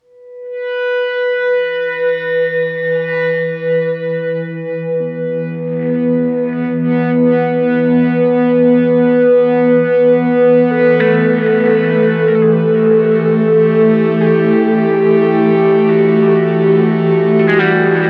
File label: Em70 Stormclouds